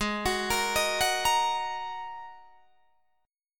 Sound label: Ab 7th Suspended 2nd down Guitar DADGAD